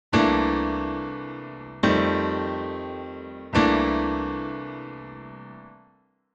chord progression

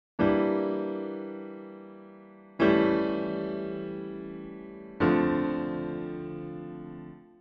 minor ii V voice leading

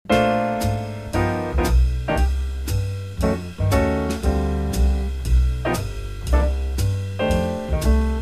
chords in Bb

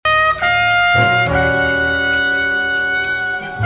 Eb7 alt

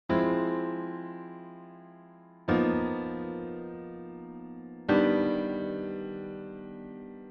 Piano Basics rootless voicing

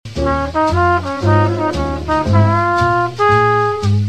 triad

